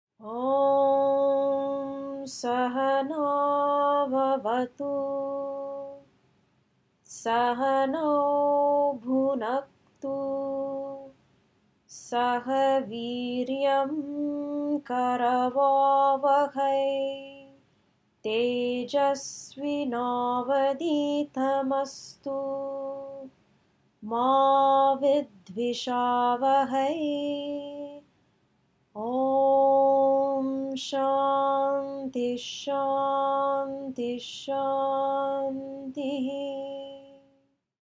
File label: Om Saha Naavavatu Opening prayer